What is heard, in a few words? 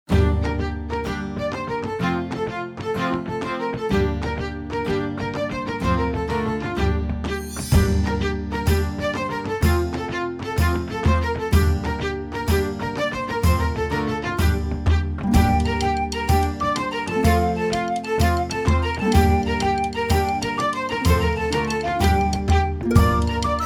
medieval irish celtic ireland music